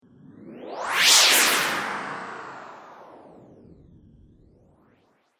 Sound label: Astral Time Warp Jump, science fiction sound effect; Science Fiction Sound Effects